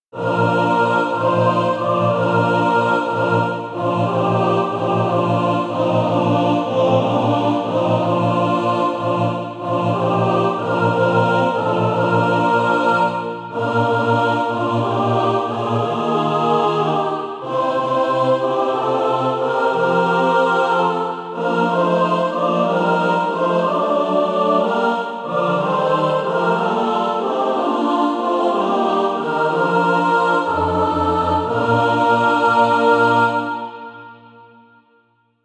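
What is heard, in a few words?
mixed, SATB, Practise Music; Cradled All Lowly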